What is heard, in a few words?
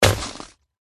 snowman attack contact bottom